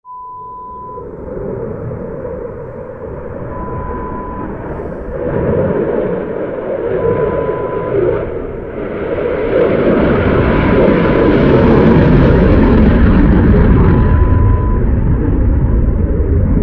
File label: Eurofighter TYPHOON sound